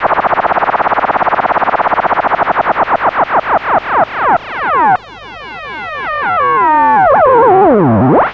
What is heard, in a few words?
LFO Impact, Analog; Industrial; LFO FX